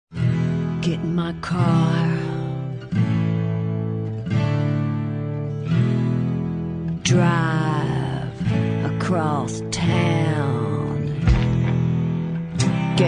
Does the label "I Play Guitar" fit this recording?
Yes